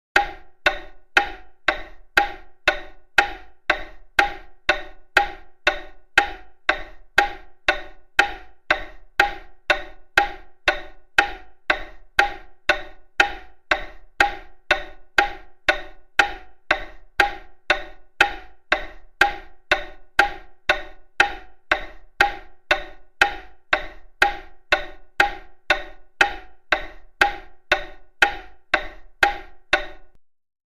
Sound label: Clock, 17th Century, Ticks; 17th Century Wooden Czech Folk Clock, Wooden Internal Mechanism, Ticks Constant